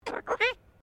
Bird Cockatoo Sulpha Crested Talking, Australian Cockatoos; Birds (Sound Effects:Animals)